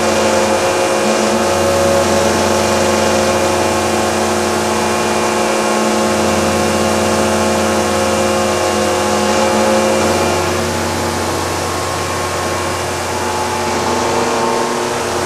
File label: Above Dome Static; Boomtown; Titanfall 2